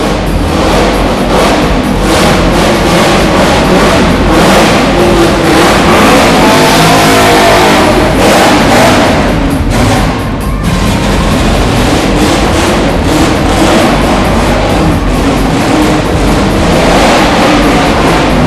Porsche 804 F1; Porsche Soundnacht 2018

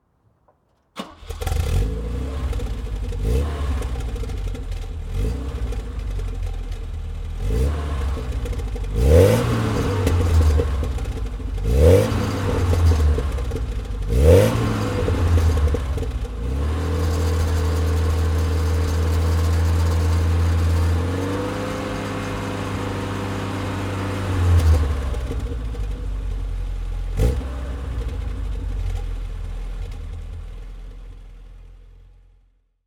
Opel GT 1900; Opel GT 1100 und 1900

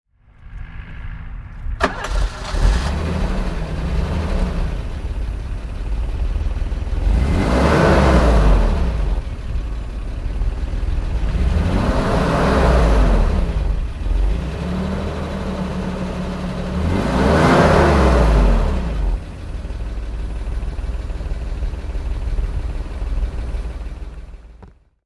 Volvo 780

